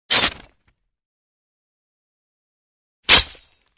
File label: Sword Stabs & Slashes Short Stab & Slash With Gravel; Hollywood Edge Foley Sound Library